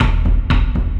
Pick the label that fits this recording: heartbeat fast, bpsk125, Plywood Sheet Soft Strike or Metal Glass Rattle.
heartbeat fast